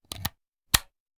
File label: Hand Gun Foley; Sound Ideas; Guns